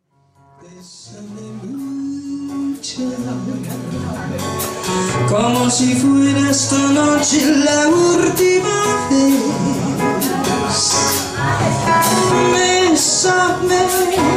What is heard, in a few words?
SINGER, Spanish Brazilian Portuguese